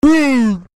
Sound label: whew